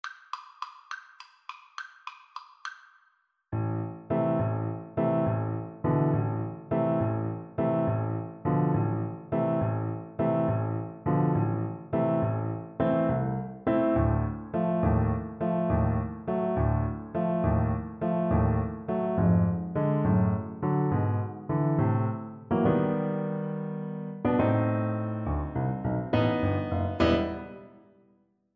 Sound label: dukas sorcerer apprentice, tuba